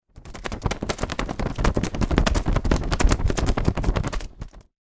flag small hand held on plastic stick wave flap fast; zapsplat